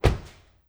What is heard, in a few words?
Auto Nissan Altima Door Close; Transportation